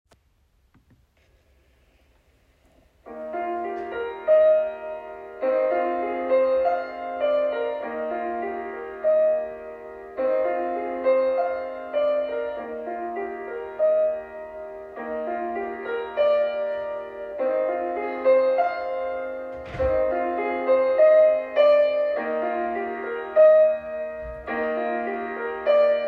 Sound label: Accompaniment Track; Tenor Bass